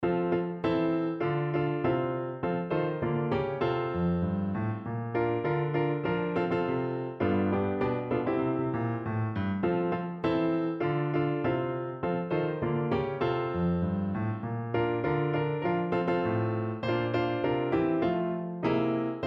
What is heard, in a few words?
piano; Rolnicky